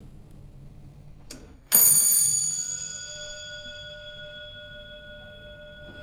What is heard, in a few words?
single resonant clock bell strike